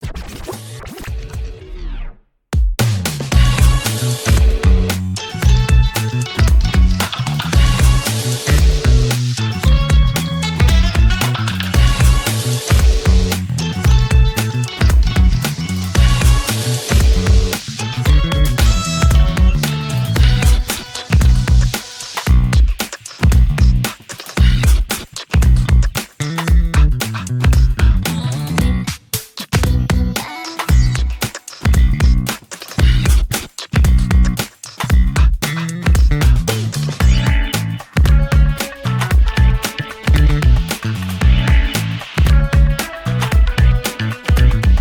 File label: World Tour Mode Intro - Instrumental Version; Street Fighter 6